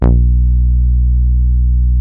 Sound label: TAURUS C3, synthesizer